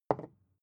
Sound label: bottle put down light